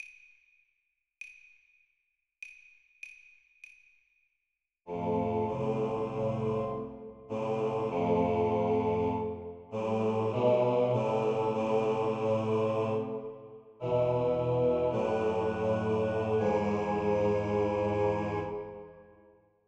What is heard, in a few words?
BASS